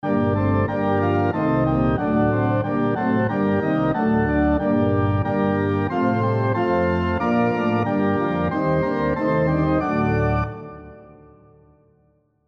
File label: SATB on Piano Example